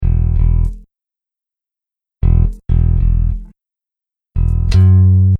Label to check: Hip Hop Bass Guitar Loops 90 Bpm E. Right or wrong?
Right